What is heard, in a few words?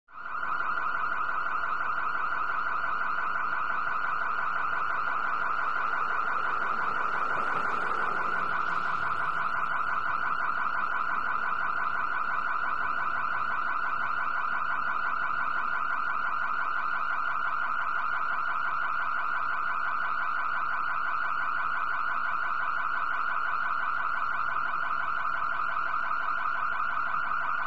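Alarm Fast Oscillating, car alarms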